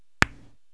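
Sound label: stroke, 9ball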